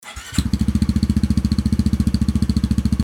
ct125 reverse; ja55; outtex; daytona

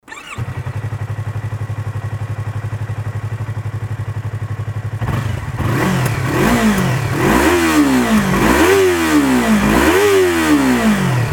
yzf r25 normal

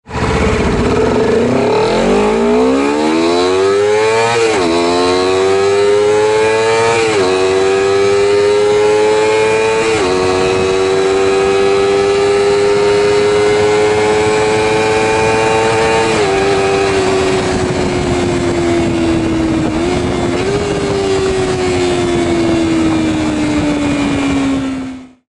cbr250rr acceleration

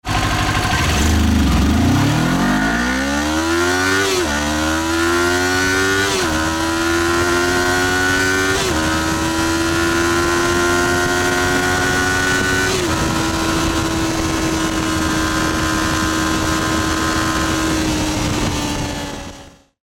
ninja400 acceleration; zx250e

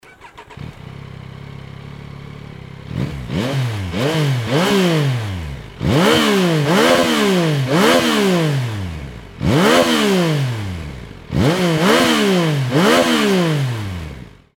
mt09 normal

